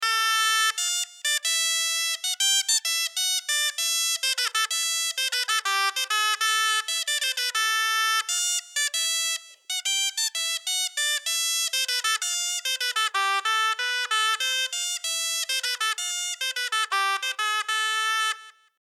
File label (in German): SCHALMEI SOLO